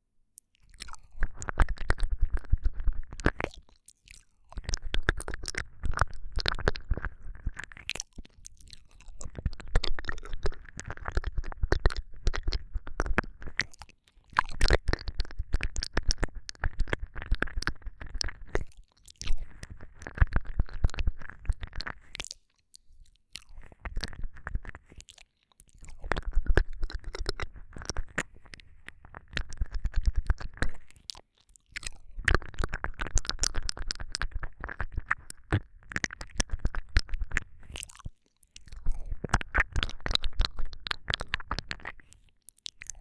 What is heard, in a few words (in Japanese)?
舌を感触を楽しむ耳舐め; 【超耳舐め特化】ふわふわ気持ちよくなる耳舐め【安眠ASMR】